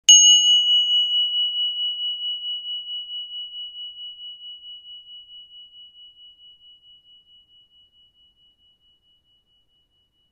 sky-slice-jupiter

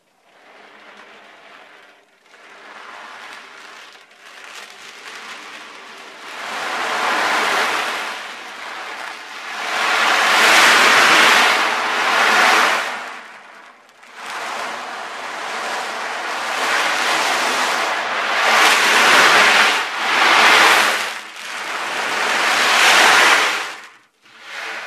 Oceandrum

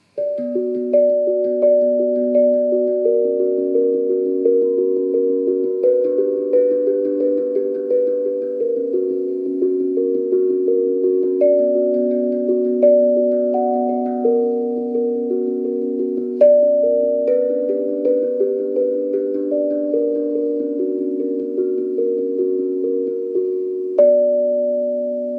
Zenko Solstice Impro Hand

